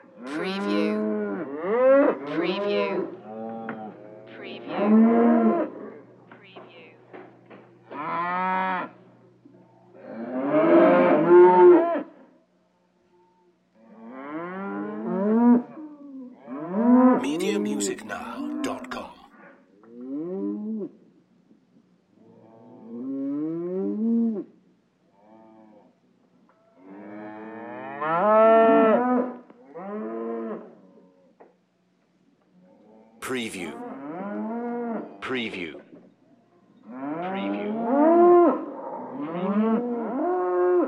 Cows Many Bellowing

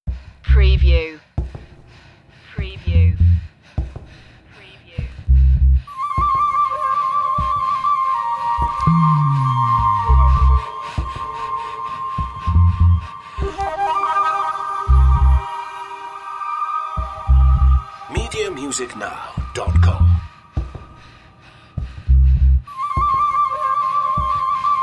funky retro mystery

